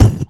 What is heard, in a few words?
Beatbox Kick, Human Beatbox